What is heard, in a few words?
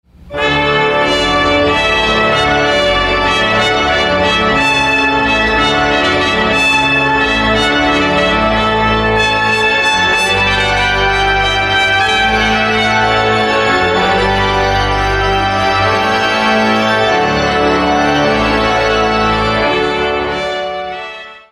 Hornpipe, Cincinnati Wedding Ceremony Music; Hornpipe from the Water Music George Frideric Handel; trumpet(s) and organ